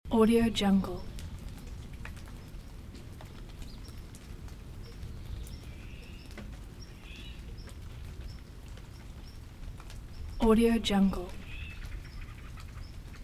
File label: birds after storm ambience loop